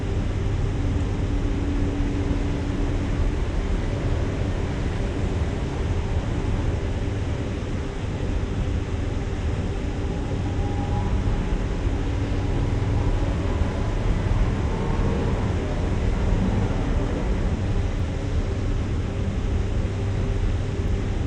big machine room

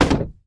Drop Metal Box

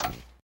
Turn TV Off

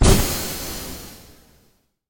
Puff

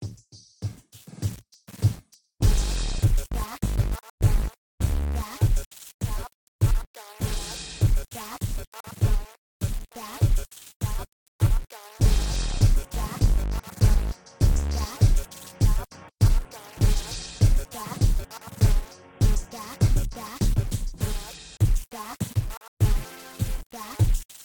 Phone Theme

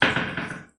Loud Creak

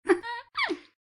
Laugh

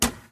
Breaker Lever; Lethal Company

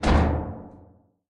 Ship Turbulence; Lethal Company

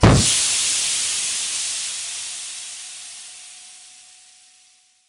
Pipe Burst Initial Sound; Lethal Company

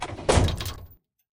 metal hatch close

